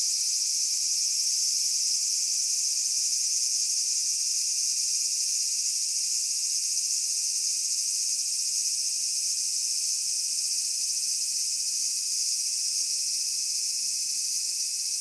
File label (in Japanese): アブラゼミ